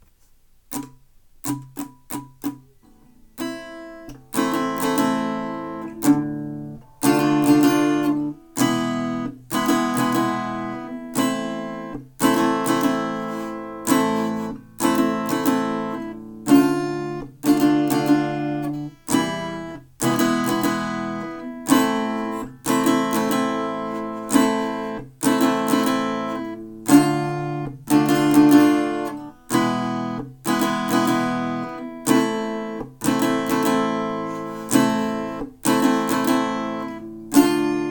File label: guitar version